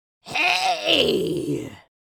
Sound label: Witch fantasy vocalization pain hurt